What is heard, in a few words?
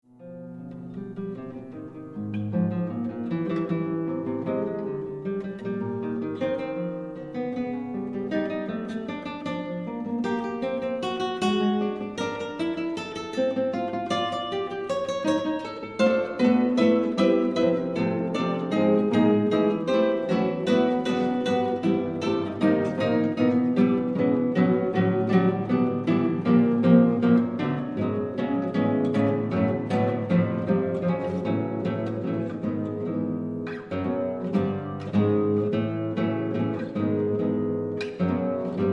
Duet for two guitars